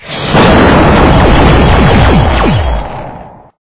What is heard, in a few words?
fish kill big